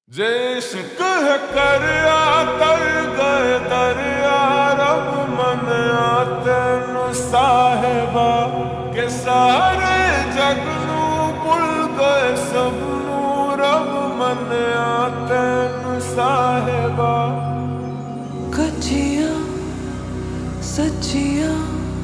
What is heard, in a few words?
Slowed Reverb